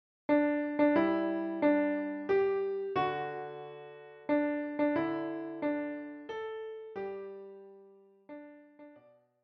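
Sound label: piano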